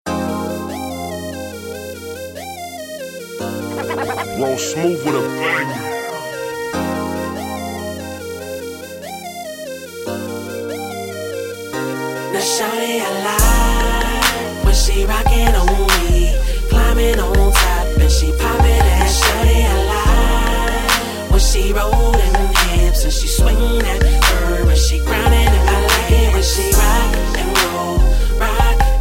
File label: Rock N Roll